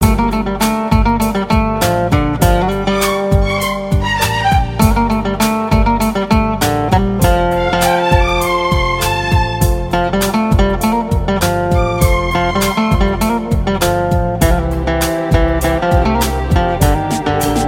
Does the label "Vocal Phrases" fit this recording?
No